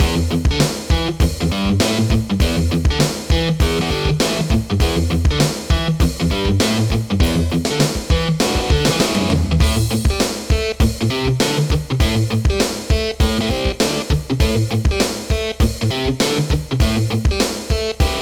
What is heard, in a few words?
Guitar Mayhem; action